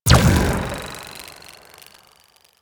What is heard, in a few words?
Spear Throw Energy, Weapons; Warframe; Tenno; Priest Spear